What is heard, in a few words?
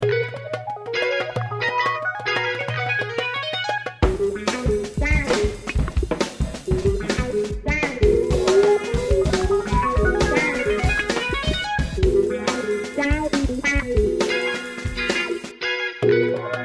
funk